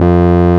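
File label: RMI EP piano F 1; Sonic Reality - Vintage Timetraveler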